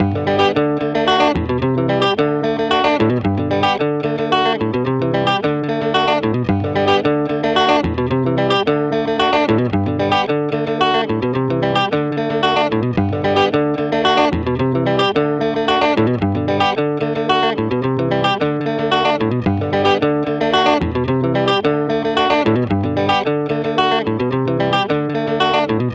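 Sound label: Guitar Loops G major 74 BPM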